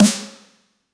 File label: indie grungy snare sound